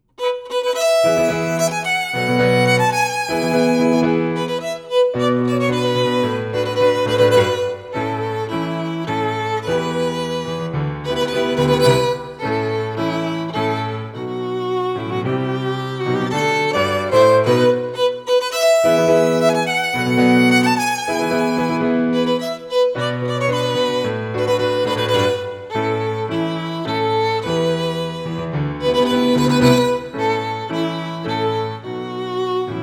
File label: Violin